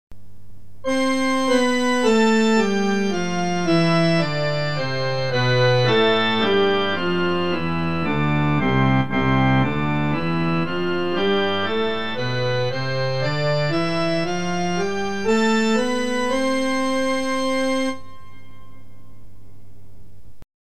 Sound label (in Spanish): escala desc ascend